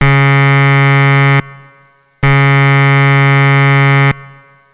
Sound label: Hospital Code